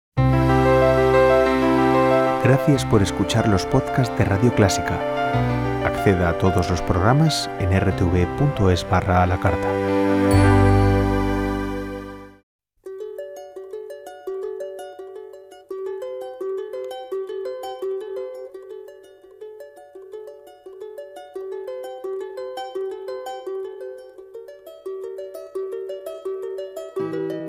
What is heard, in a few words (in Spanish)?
La gaita y la guajira